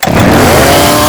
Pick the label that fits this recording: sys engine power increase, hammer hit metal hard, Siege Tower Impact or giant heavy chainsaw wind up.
giant heavy chainsaw wind up